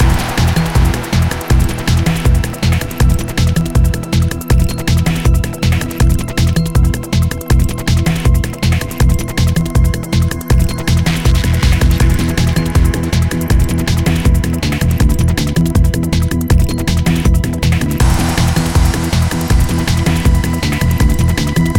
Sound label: chase; Mirror's Edge (2008)